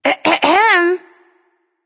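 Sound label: clear throat, female; smite; voicepack; hera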